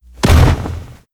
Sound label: ice guardian, monster